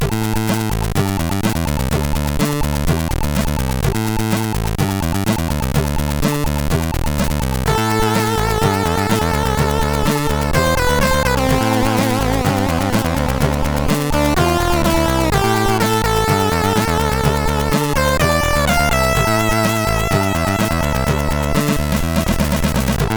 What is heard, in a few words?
Tetris crackintro sid MOS6581R3